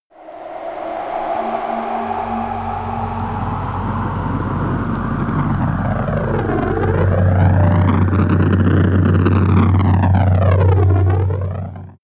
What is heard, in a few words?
rocket liftoff